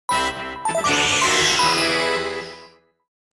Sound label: 3DS Menu Jingle; Pokémon Ultra Moon; Pokemon Sun Moon Ultra The Definitive Soundtrack